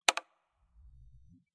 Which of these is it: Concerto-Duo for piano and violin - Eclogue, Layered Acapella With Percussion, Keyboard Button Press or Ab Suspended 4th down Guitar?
Keyboard Button Press